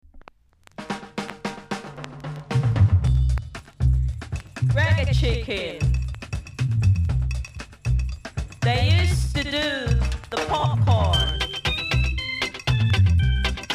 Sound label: FUNKY REGGAE; ska 2014